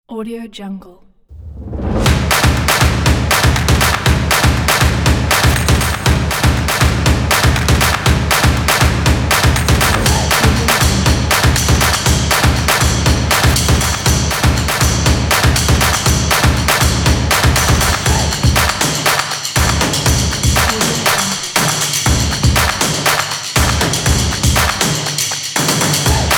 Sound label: percussion